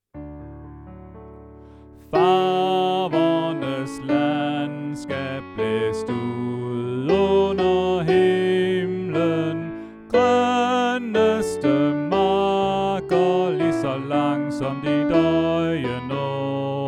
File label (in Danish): Sopran; oevefiler